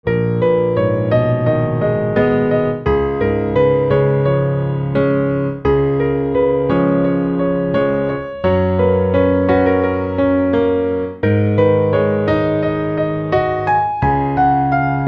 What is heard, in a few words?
Piano Silent